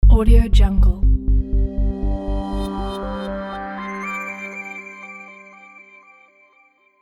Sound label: pulsating reveal logo